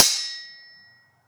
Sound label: Sword Clash